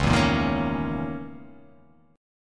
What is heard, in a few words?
C sharp Minor 6th Add 9th C sharp